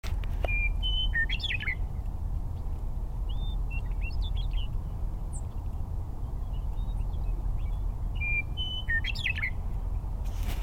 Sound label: Western Meadowlark Song